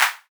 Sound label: Clap